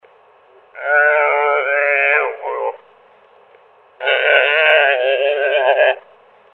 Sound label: ADULT DEER DISTRESS